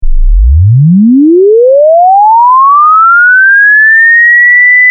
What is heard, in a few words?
20-2000hz lineair; testsignals